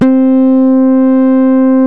Synths BASS

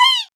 Zaghrouta End, Roland BK-5 Percussion

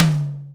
TOM SGWGA1, Ensoniq ZR-76

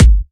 Electro Kicks

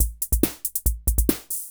140 POPS

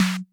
noise tom, Drum Machines, Clavia Nord-Drum; 300 Drum Machines

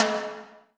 HI TIMBAL; EdgeSounds; Drum Mashines; R-8 DRUMS

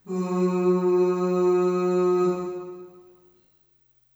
Ooh Short F#2 Short Reverb; Harmonic Building Blocks

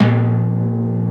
TIMPANI; Crypton - DrumBase5000; T1 DRUMS

